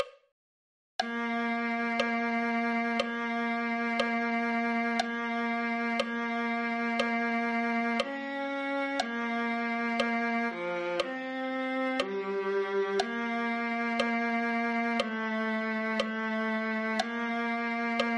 viola

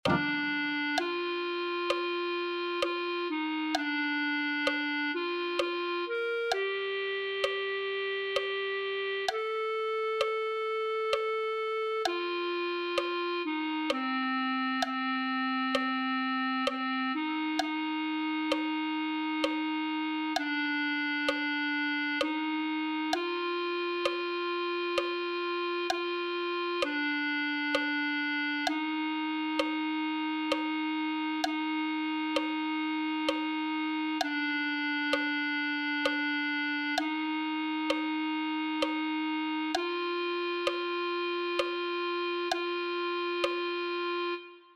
clarinet

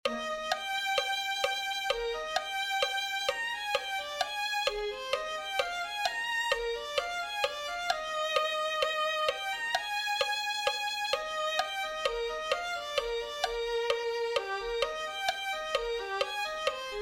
violin

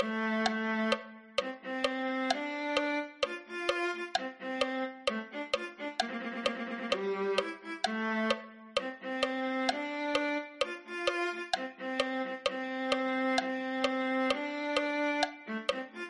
viola

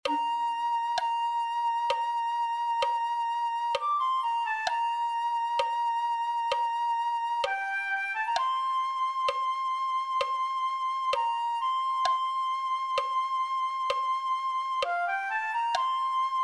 flute

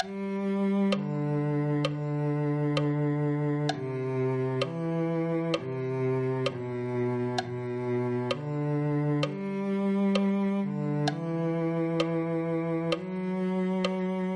cello

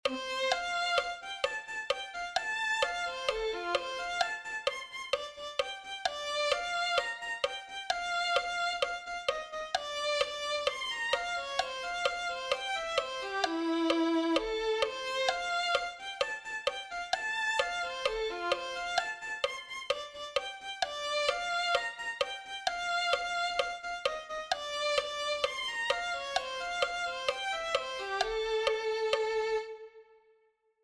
violin

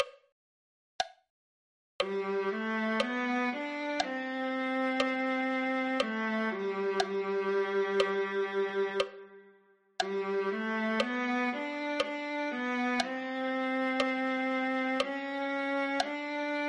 viola

